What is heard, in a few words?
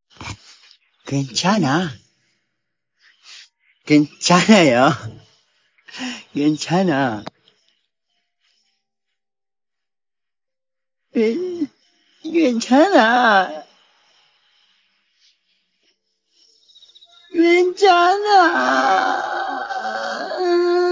vocal only